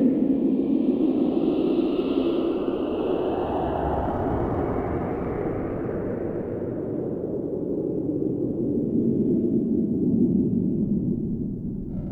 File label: harsh wind